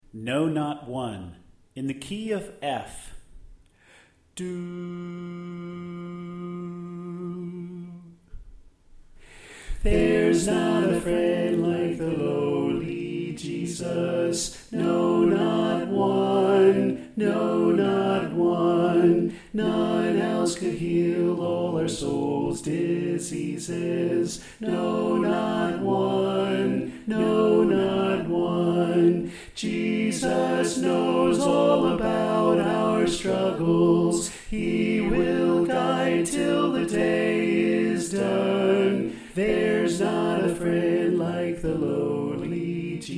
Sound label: Soprano Left